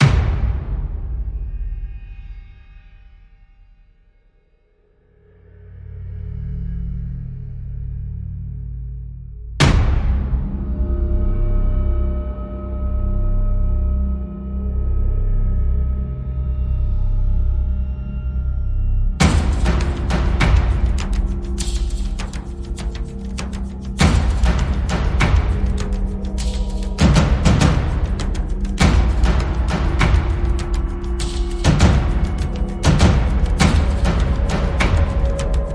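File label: Percussion and Pads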